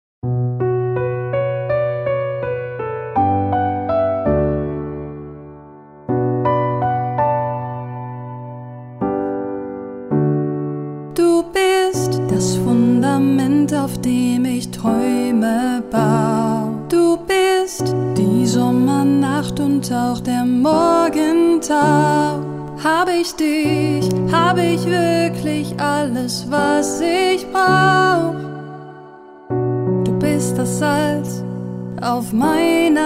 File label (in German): Hochzeitssaengerin; Schweiz